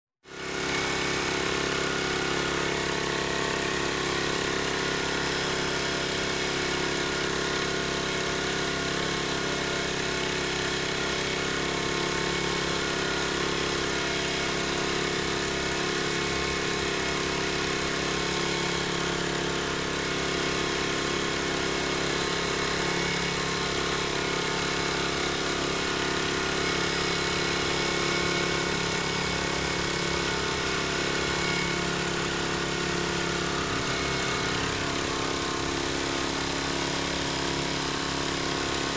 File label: traffic